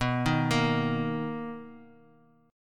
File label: B Major 7th B down, Bass Standard; arpeggiated; JGuitar